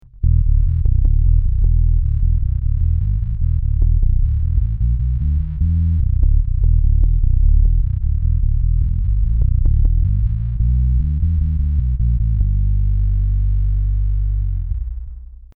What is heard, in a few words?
Darkglass Microtubes X7 Low Band active